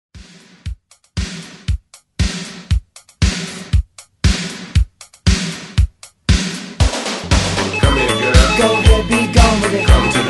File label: 80s Hype 117 Bpm; DJDOLLS